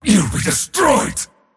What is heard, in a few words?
attack pain; dota; mars